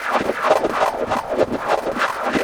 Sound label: Echo Chuff Splat, Synthetic Pulses